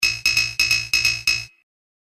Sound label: A Series Of Clicks